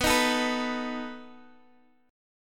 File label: B 7th B down Guitar Half Step Down